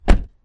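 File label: close car door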